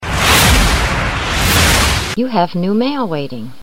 new mail

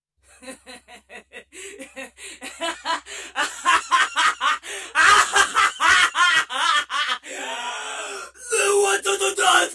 evil woman laugh

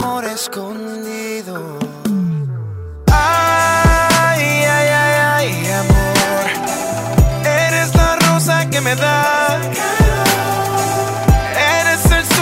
bachata

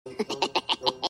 laugh; despicable me 2

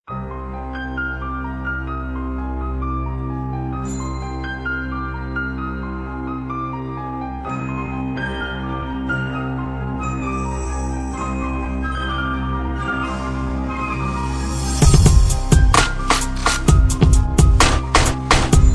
intro beat

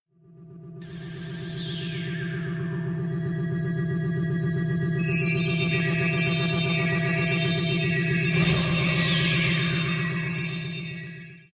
ufo classic 60s

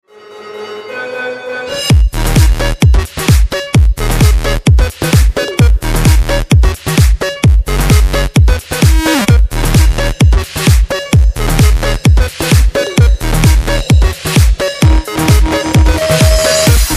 fight electro dance

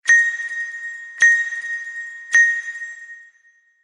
message tone; htc

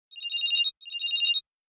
executive cell ring

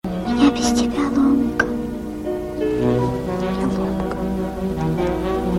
lomka sms

